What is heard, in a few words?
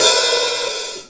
Hi Hat Long Close; GMPercussion; 3DO; Toolkit 1.5; GMPercussion22k